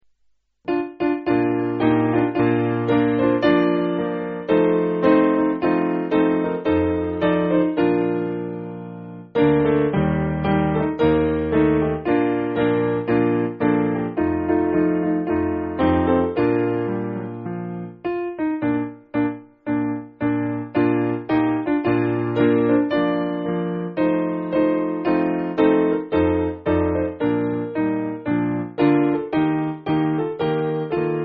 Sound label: Piano